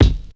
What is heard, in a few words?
Cosmo Kick, Ensoniq ASR-X Pro